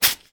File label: food drink bottle of sparkling energy drink open